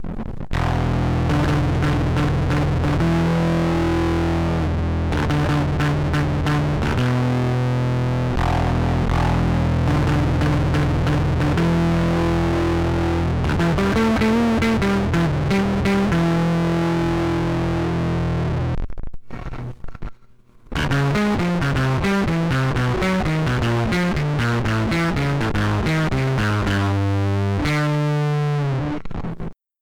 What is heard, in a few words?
1N4148 Scream, Over Drive; シンプルなOver Driveの製作